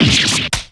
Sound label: damage